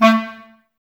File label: clarinets, Wood Winds